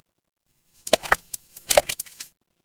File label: water splash